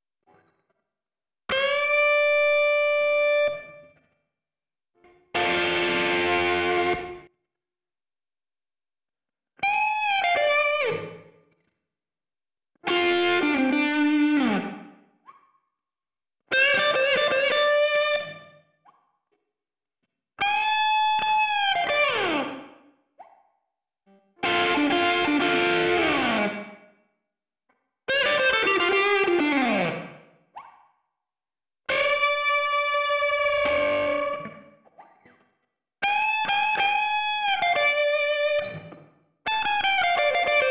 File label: isolated lead guitar track